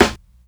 Fluffy Steel Snare Drum Sample C# Key, Royality free steel snare drum sample tuned to the C# note